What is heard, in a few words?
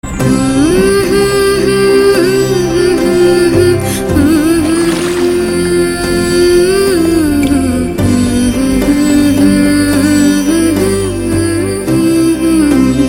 tamil music; children